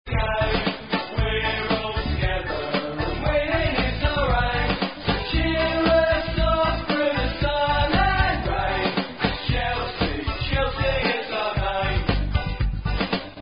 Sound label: Song